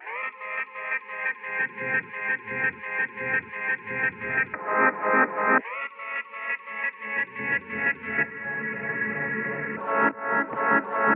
Rewind Melody 172bpm A min

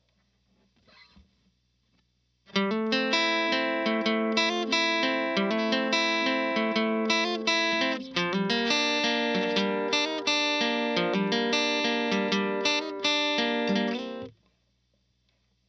Guitar Camping 86bpm C maj

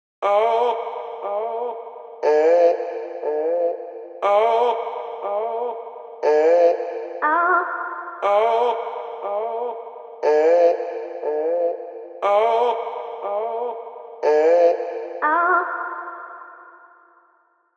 Vocal Loop Cm

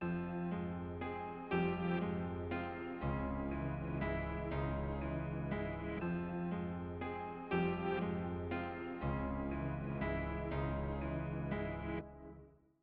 Horror 120bpm Fmin

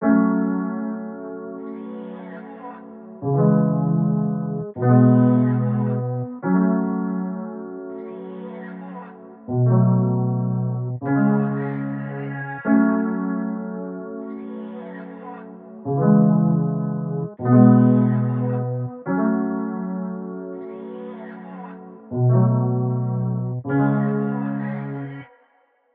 Melody 152bpm F m

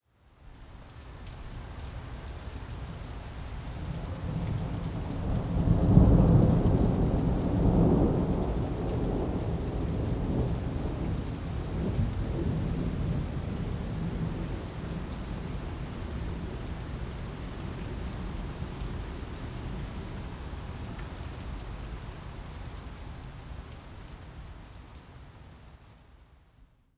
Thunder Foley; Loop, WAV

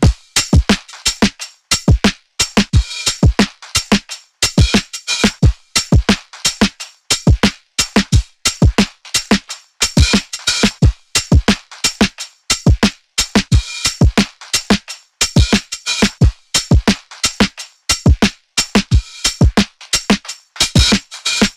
Drums 89bpm

